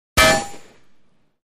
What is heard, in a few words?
Bullet Bounces Off, metal